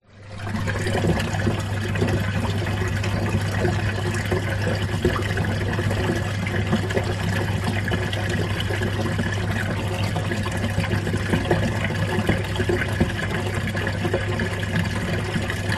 household washing machine empty drain gurgle

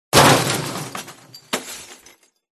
crashes metal glass; Audio Mangler

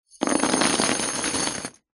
industrial chain med set down on wood panel; zapsplat

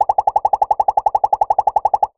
cartoon pops fire quick succession

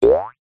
cartoon boing ascend jaw harp; zapsplat

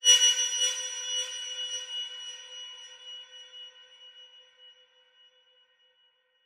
sound design horror metal stab

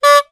cartoon horn hooter honk single; zapsplat

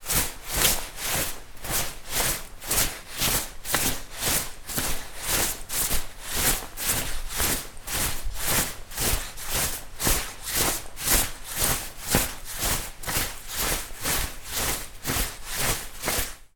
foley raincoat movements walking

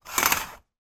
household curtains on metal rail short movement; zapsplat

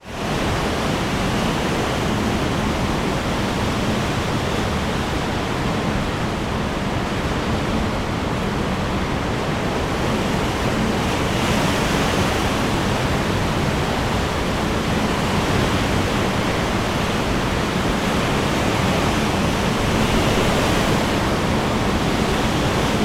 wind strong constant end of pier storm

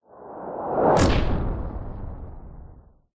cinematic whoosh powerful hit; sound design

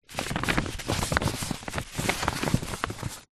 paper sheet a3 movements; foley

